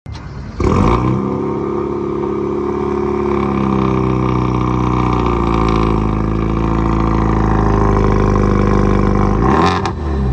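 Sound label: Forte Koup, اگزوز; سراتو کوپه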